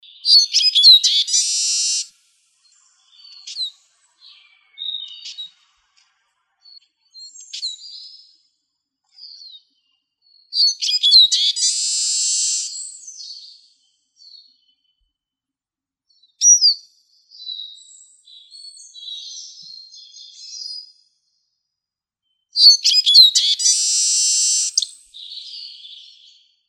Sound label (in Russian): chizh